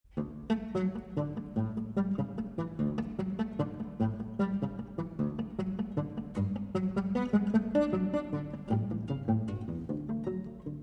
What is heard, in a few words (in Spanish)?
guitarra